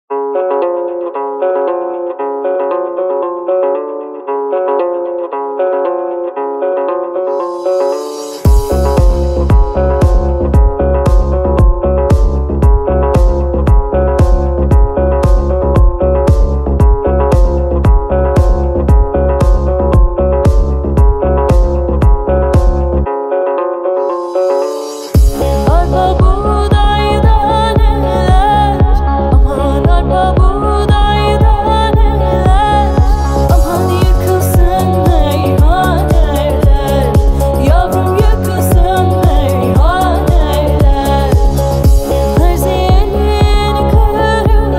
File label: jazz